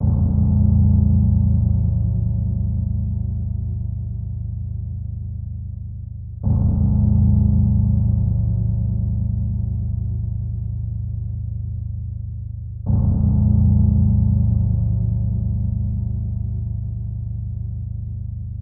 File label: Low Bells Repeat